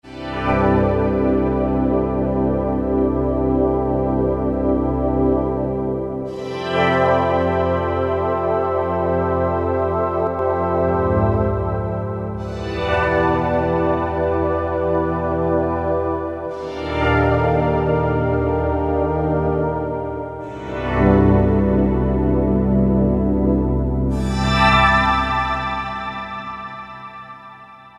Soundtrack, Roland D-50; Factory preset demos

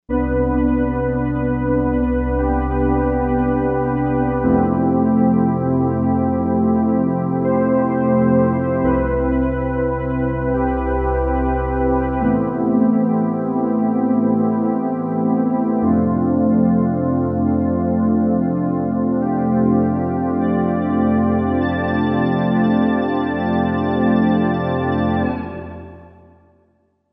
Soft Pad, Korg M1Rex; Factory Patches

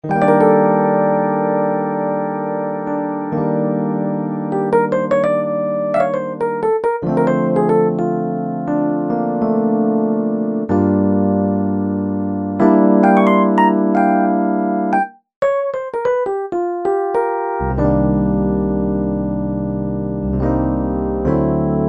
SUITCASE; Universal Sound Bank; Soundscan 09 Old School Keyboards

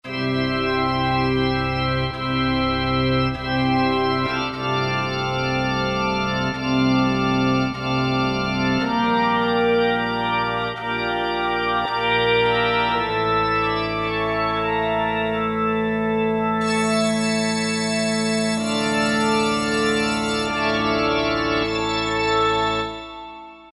Huge Pipes; Roland PN-D50-03

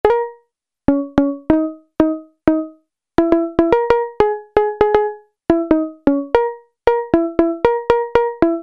Plink; Alesis airSynth

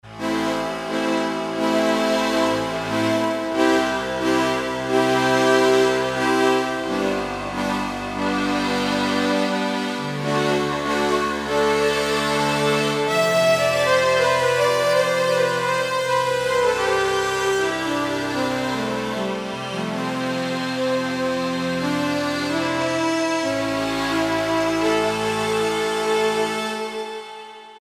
Saw Play, Korg MSC-6S Fretted Insts; Fretted Insts 1

